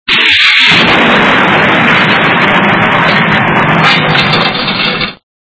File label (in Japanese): bakuhatu